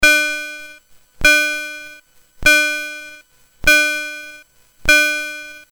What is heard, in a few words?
Gong, Commodore; CSG8580R5; M5 Gong BASIC